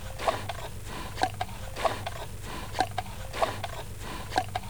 munch; Tempra Access; vision

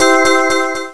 Good End

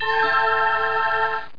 accept; landslor

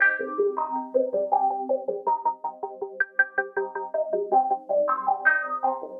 synth, ambient, 160, C; Keys; eJay

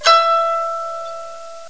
ting; BigMax nº 1

